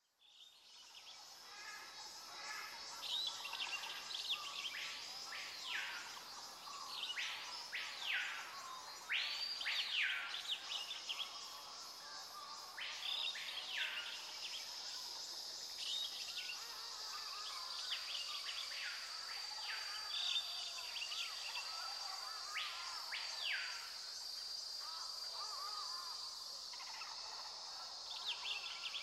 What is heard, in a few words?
Ambient; Age of Empires III; cinematics